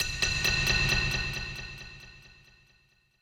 BGM Player Dying Out; Babylon's Fall; ps4 ps5 windows

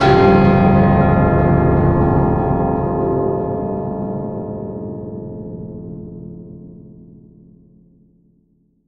Swipe; Trinity; Last Inua

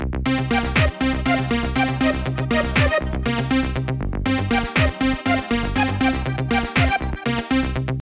Aerobics; Dreamer Series Top Model; nds

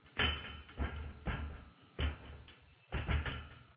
Animatronic Move

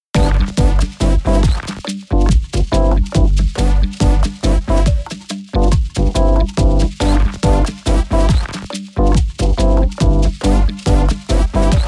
Conversation Screen; bullet girls 1 2 soundtrack

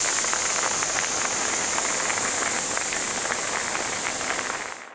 Wall slide

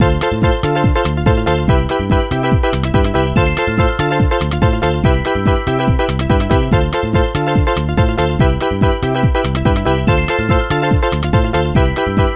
BGM Star; Super Mario Maker

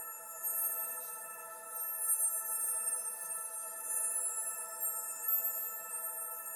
Motion Controller Hum

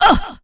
Uhh

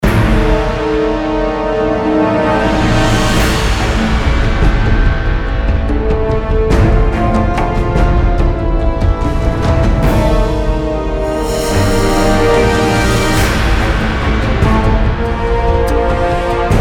Desert Dungeon

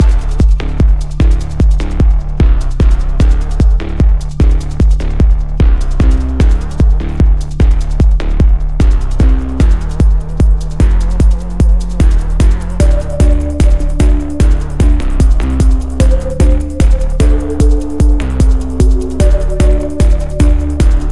music cat-and-mouse loop; Necrobarista Final Pour

